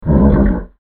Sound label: horse